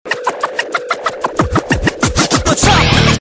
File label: sms scratching; ringtones